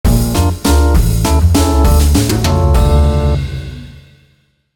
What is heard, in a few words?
Continuous Failure